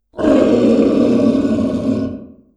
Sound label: roar